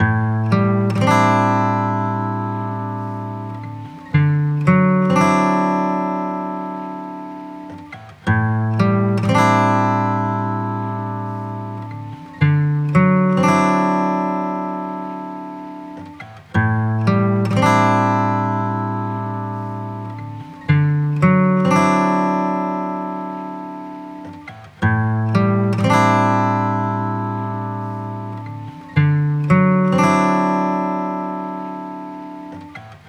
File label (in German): Gitarre Original